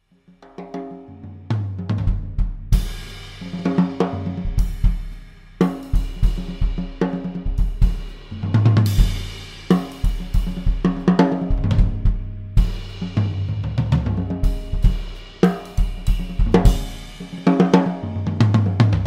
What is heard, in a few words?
big beat groove